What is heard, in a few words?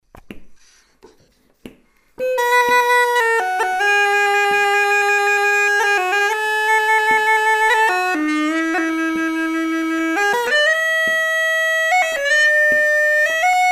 b chanter